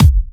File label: Soft Kicks; Vengeance Samples